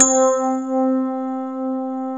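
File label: grand piano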